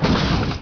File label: BOOM; WARPATH